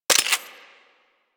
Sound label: Hand Shotgun Alt Mech